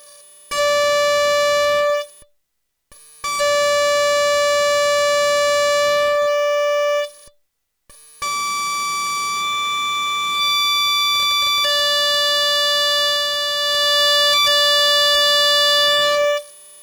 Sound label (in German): Geige Saite; Violin; Physical Modeling of Musical Instruments